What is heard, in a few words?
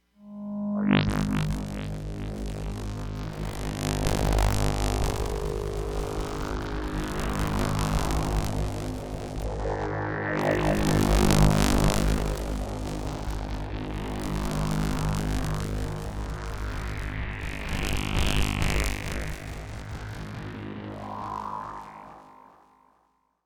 insect fx, jupiter6